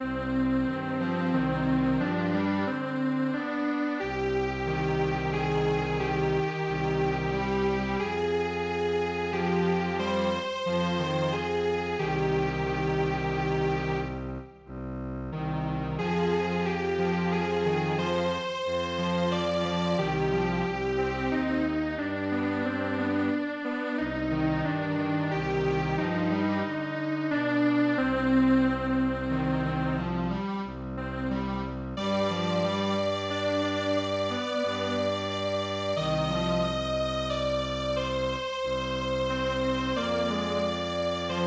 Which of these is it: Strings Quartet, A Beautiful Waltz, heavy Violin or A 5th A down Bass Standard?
Strings Quartet